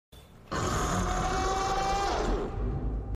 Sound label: bazelgeuse scream monster; monster hunter world